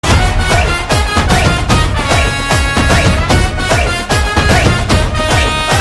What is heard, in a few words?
BGM Ringtone; Dhamaka Movie